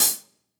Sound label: Acousti Hat Hit, hihat; Ultimate hihat samples